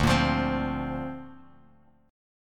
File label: D-Minor 7th Sharp 5th D Bass Standard, chord; Dm7#5; strummed